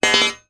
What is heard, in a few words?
impact metal bar hit place down heavy; zapsplat